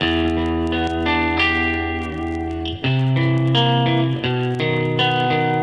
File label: guitar